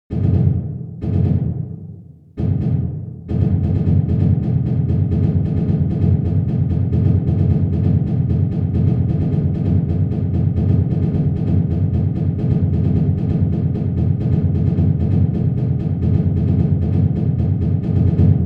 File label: School Songs bassline; marching